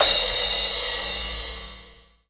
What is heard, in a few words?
Crash; Equipped Music; Loungin House